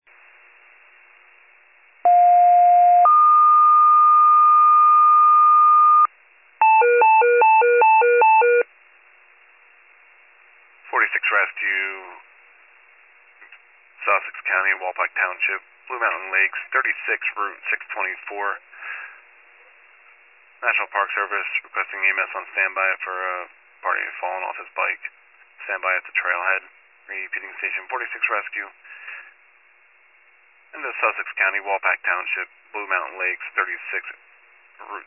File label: fire, pager alert